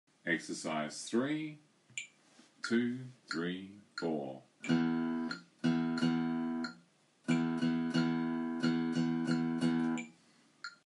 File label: Rhythmic; Grade 3